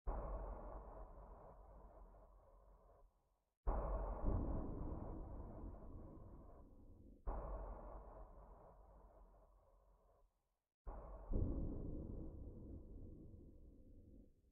Quiet Drum; Lethal Company

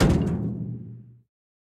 Weapon Metal Heavy

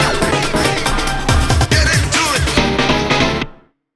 Map Lose; Buster Jam; MSX2

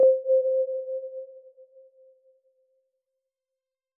Countdown start

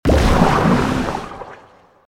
Blood Laser

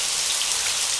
Shower; Neighbours From Hell Console Edition; GC, Xbox

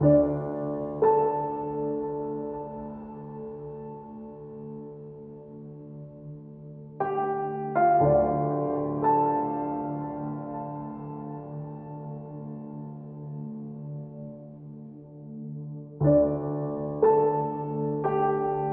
Very Sparse Piano LOOP; gamerip; eversoul; android

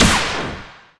Enemy Delete

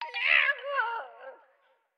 Skeleton Death

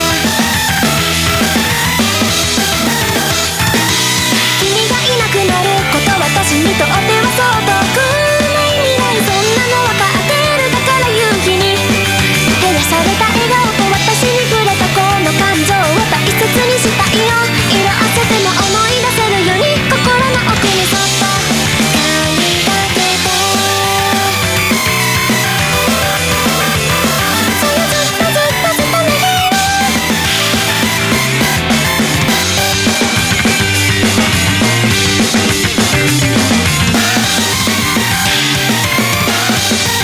Bgm Reach

